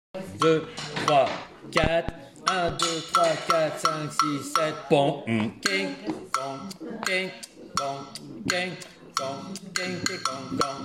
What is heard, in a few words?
baiao break vers theme agogo